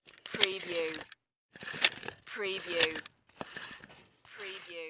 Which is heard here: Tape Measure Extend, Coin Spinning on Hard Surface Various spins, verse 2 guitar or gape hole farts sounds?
Tape Measure Extend